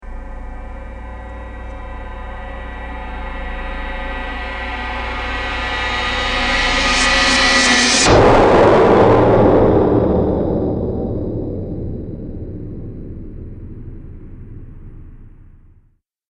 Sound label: Dramatic Impact Stinger